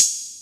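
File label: ELECTRONIC HH; Pro-Rec - Synthsonic; TRINITY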